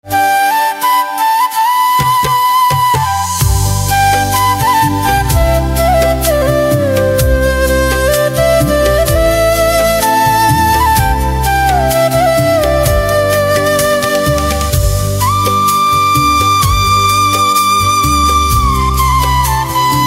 Flute

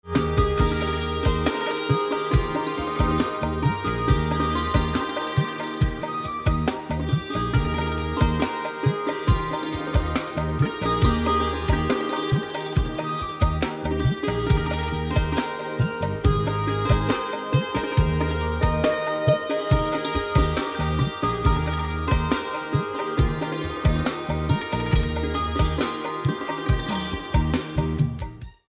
Santoor

